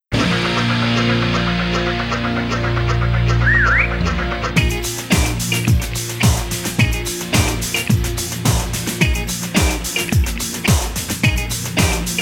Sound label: Funky; Little Big Adventure; MS-DOS